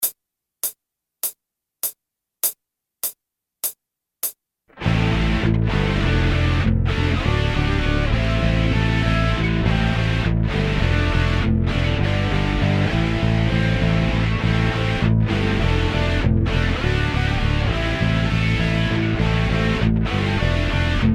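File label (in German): 8tel Play Along ohne Drums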